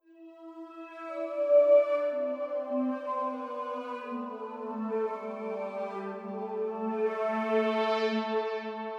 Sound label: keys, Loops, Sad